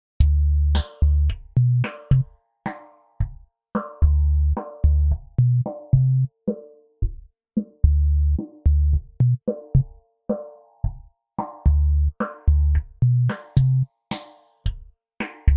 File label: bass